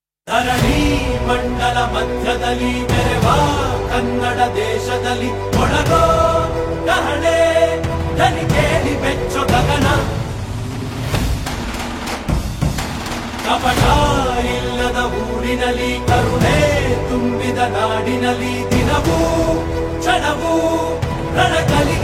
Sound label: song ringtone